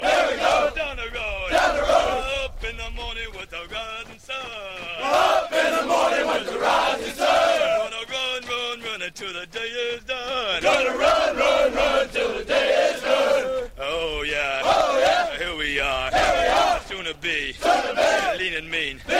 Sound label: Military Cadence